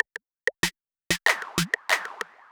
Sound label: 808 Loop SD 95, Roland 808 Drum Loops, 95bpm